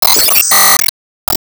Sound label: Smash Shot